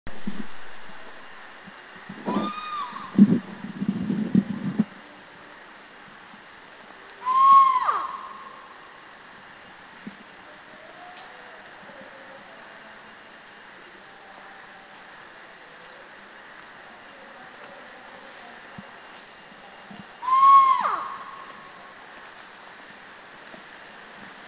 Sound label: Spot-bellied Eagle Owl, Laos